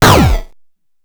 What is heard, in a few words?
grind, EMU XL-7